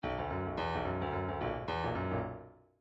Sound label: midi grooves funk